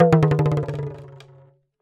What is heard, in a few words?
Talking Drum Bounce; Percussion Samples